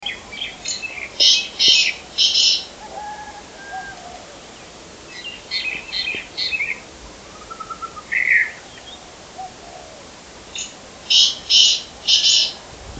Black Francolin

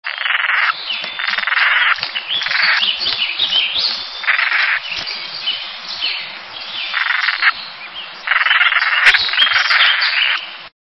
Red breasted Sapsucker

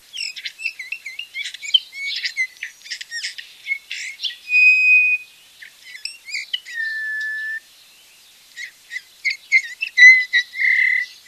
Eurasian Tree Sparrow, songs

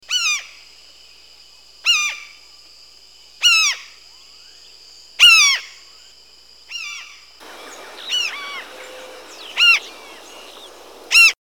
Large billed Tern

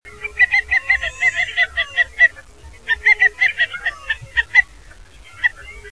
Common Pochard, songs

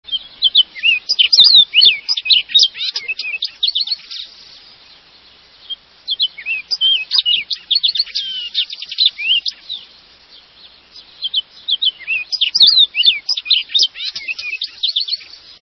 Orchard Oriole

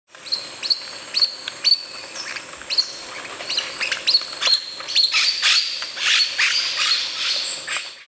White winged Parakeet

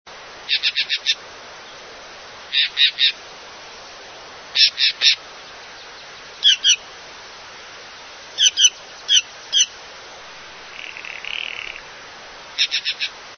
Green Jay

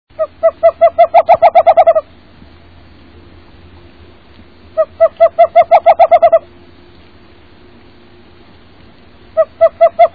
Western Screech Owl

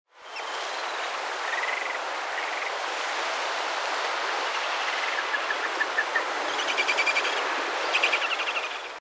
Horned Puffin, songs

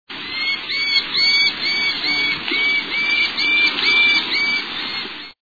Aplomado Falcon

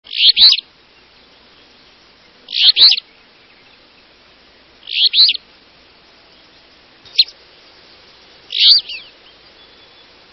Alder Flycatcher

